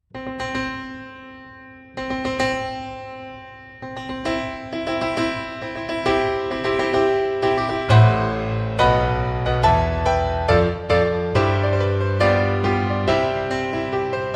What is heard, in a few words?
Wedding March; from "A Midsummer Night's Dream" - Felix Mendelssohn; classical